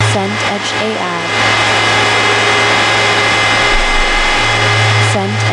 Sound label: crowd stadium roar